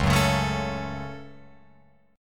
C sharp Suspended 4th Sharp 5th C sharp down Guitar Open F; strummed; JGuitar